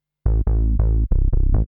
TECHNO BASS 140 A

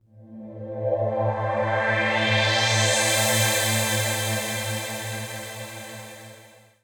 TECHNO PAD 140; Keys

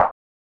Snares & Claps, One Shots

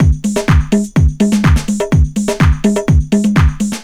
HOUSE BEATS 125

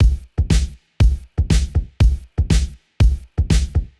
120 Funk It Up, Electric Drums; Loops; Touch Loops; CosmicFunk

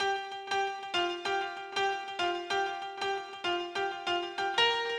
PIANO 96 G; old school

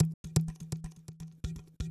PERCLOOP ETHNO 125

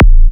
Minimal Kick, Drums, Kicks

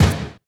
One Shot, Hits

